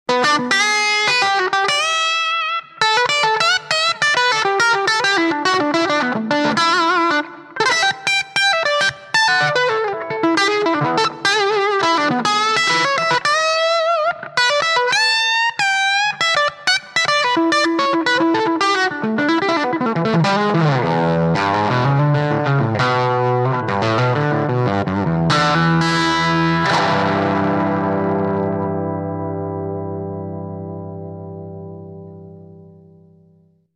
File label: LP bridge low gain; space tone